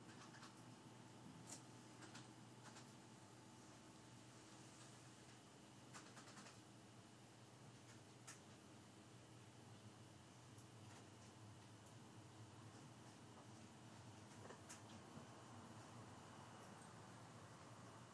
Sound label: office ambience